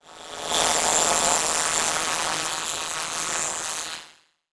Transition Electrical Distortion; Adobe; Production Element

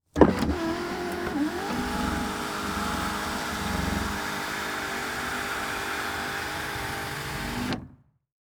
Saturn Open Sunroof Interior; Auto; Transportation

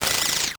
Title Transition Data Transmission Computer; Adobe; Production Element

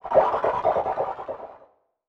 Production Element Title Transition Liquid

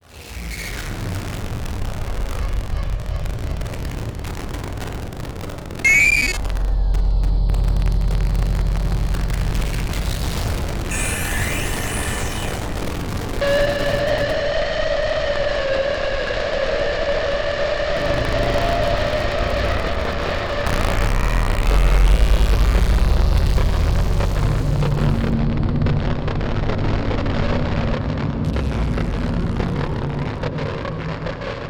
Distortion Random Static; Module Production Element Title Imaging; Imaging Elements

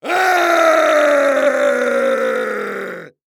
Horror Scary Human Yell Scary

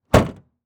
Police Car Trunk Close; Auto; Transportation

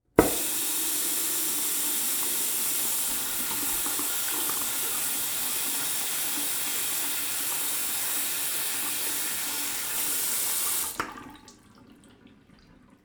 Human Male Washes Hand In Sink

